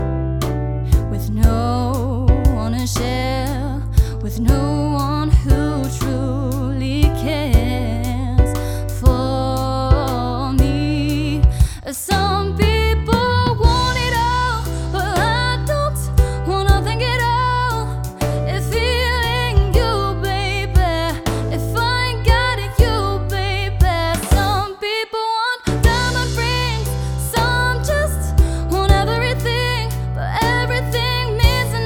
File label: export mix; beyerdynamic